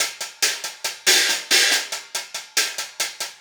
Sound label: Hi Hat Pattern